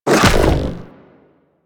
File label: Hoverboard Jump; Warframe; Vehicles; Venus; anim sounds